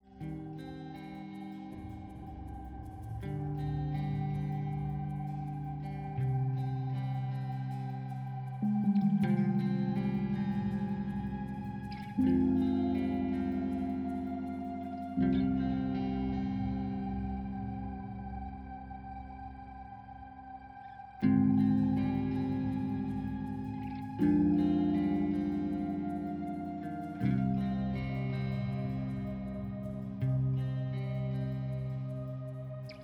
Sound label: Level Start Sting; Dead to Rights: Retribution